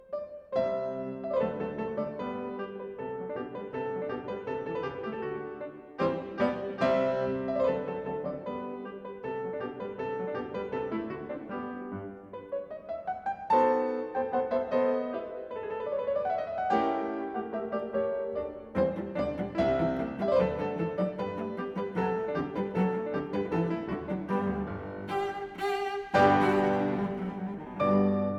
Sonata for Cello and Piano in G minor, Rondo, Allegro